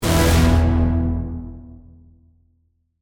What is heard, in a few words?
Synth, Stab, Reverb; Stabs; Sound Design